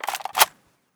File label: dsr clipin, weapons; dsr 50